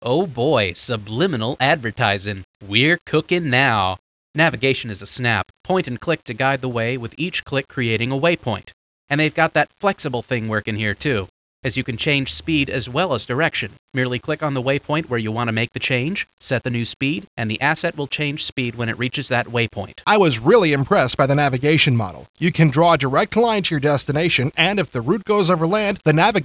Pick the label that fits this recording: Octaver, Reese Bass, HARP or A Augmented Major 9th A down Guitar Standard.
HARP